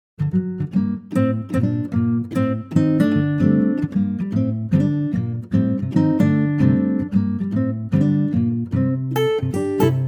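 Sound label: Acoustic Guitar Gm; key - Gm - vocal range - G to B; Superb acoustic guitar only arrangement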